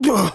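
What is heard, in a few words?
Scout pain sharp, German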